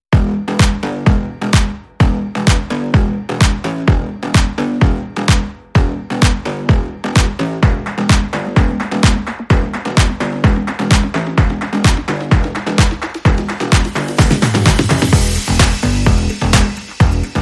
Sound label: Clap Clap Underscore